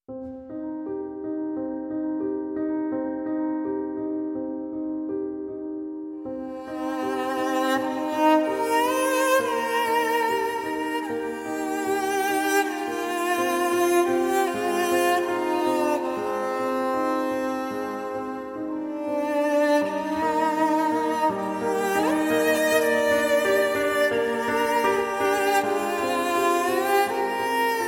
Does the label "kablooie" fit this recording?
No